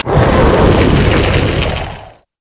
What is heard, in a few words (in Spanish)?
Grito